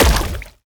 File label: enemy basic Attack impact; sfx; noxious Horror